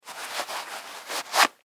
Gloves Leather Work Gloves Put On; Dressing (Sound Effects:Human); Blastwave; Foley

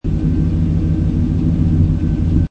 Truck 5.3 Liter Idle Loop Exhaust Perspective; Auto, truck, motor loop. 5.3 Liter Truck / Lorry. Seamlessly looping sound of engine idling

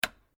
Switch Light Wall Mount On; Various switches; Household; Lights

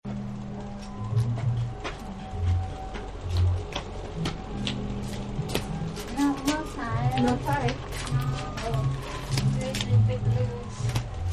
Bali Market Amb; Cities; Backgrounds; Bali Market Amb 2; City

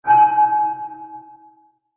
Horror Bell Accent; Bell Sound Effects; musical

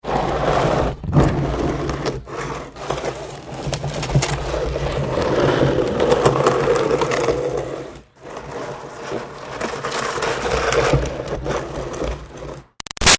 Green Garbage Pail Scrape Driveway; Plastic; Gritty; Scrapes; Objects

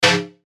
Stab Low, Bright, Metallic, Distorted, Clang

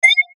Beep Tone Signal Multimedia

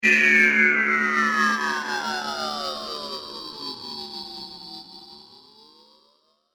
Falling Laser Charge Sci Fi Sfx; Laser Sound; science fiction sound effect; Andrew Fleming

